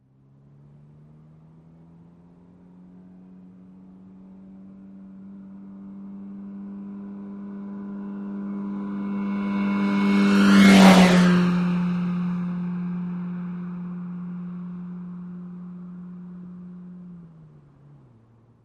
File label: Car Sports Car Long